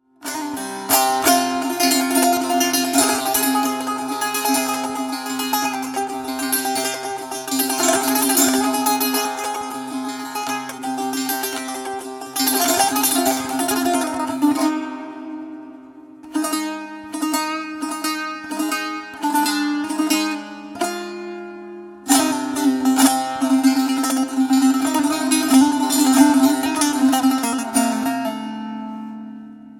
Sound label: Tanbour Solo; Kaykhosro